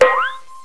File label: TOIN; 7invasores